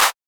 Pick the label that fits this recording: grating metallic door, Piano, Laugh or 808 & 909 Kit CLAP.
808 & 909 Kit CLAP